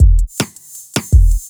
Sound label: DRUMLOOP D+B 160, Drum Bass Loop; eJay